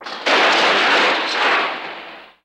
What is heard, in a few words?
Hard Car Crash